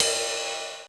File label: ride 2; Crypton - DrumBase5000; R50III